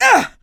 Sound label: Voices Male HurtGrunt